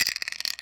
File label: Chain